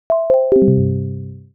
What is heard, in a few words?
Windows Lock; Emberfall